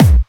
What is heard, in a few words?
Clubby Kicks, Bassdrums